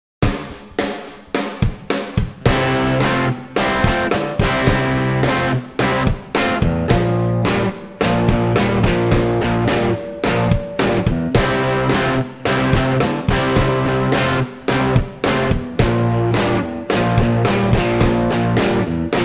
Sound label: Rhythm Guitar